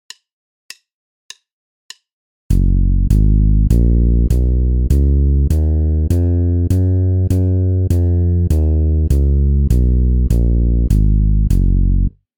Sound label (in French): Gamme majeure de Solb, basse; exercices